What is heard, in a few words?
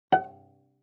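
Cello pizz fortissimo sul A Gb5; MIS Pitches - 2014; Strings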